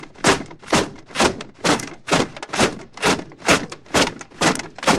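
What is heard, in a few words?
army in armor march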